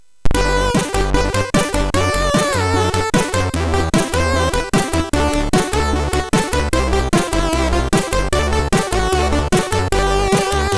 Congrats, sid MOS6581R3; hvsc

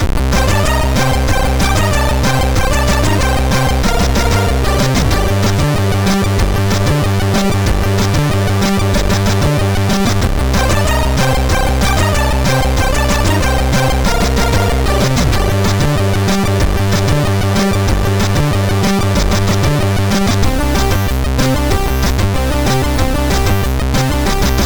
Turk, MOS6581R3

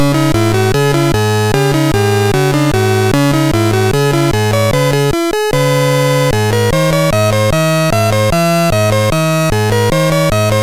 Dragons Den, MOS6581R3

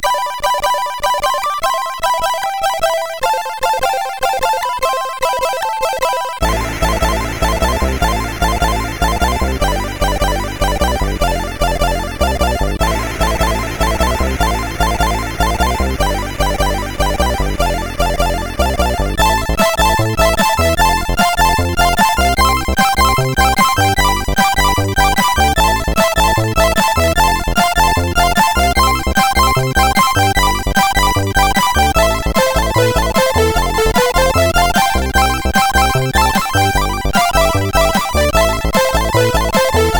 Short tune, sid CSG8580R5; hvsc; Mueller Manuel

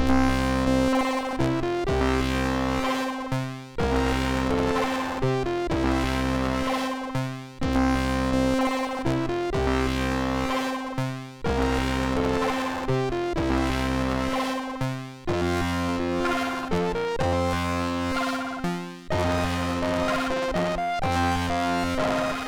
MOS6581

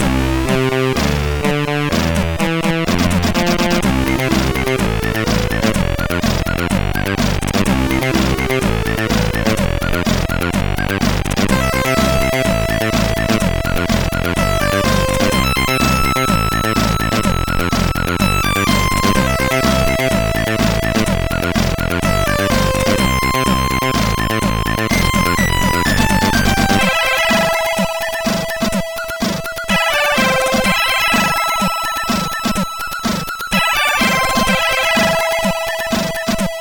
Cool Drum, sid CSG8580R5

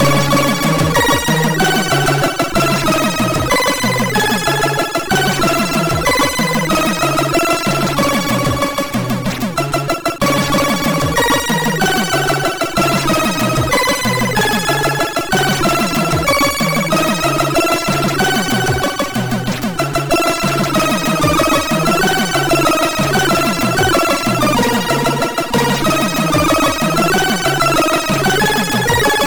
Christmas tune, MOS6581R2

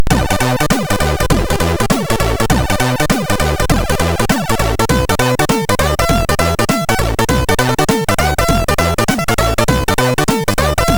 Fusion Jazz, sid MOS6581R3; hvsc

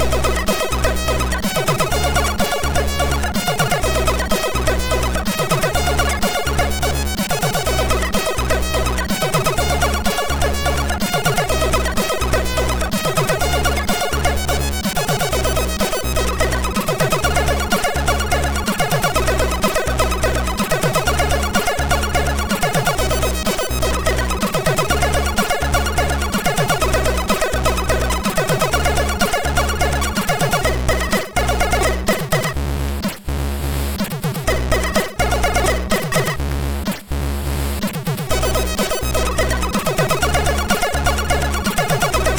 Tune 4, sid, MOS6581R2

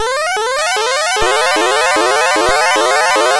Synth Sample III, CSG8580R5

